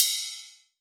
Ride, Cymbals

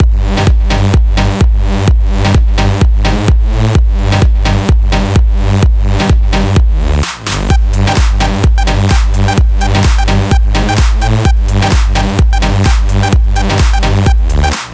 Progressive Kit Mix; Mutekki Media; Vengeance Electroshock Vol.2

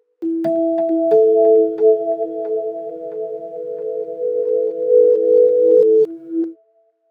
Bpm135 Lonely Vibes Bells FX Loop E; Dubstep; FX Loops

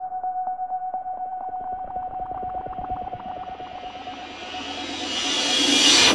FX Misc

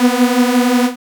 BLAST B2; dubstep; Jack Sparrow; Deep Dubstep Progressions

